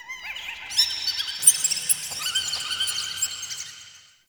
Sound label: Root Cave Small Critter Squeaks; Ambience; One Offs; Neathuns Root Cave